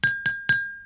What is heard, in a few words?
Clinking Teaspoon